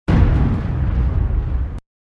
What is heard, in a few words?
explosion